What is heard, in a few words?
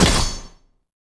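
impact sword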